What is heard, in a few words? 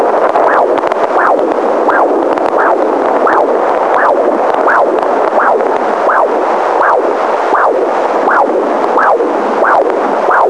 28 week maternal uterine vessels